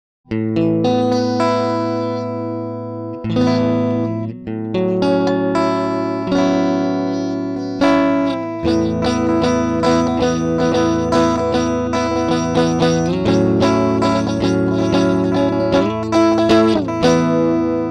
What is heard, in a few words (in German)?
goldmike mk2 E Git. Flair Tube Amp